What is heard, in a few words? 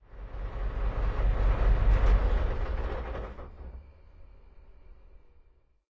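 chieftain engine interior stop, tanks; War Thunder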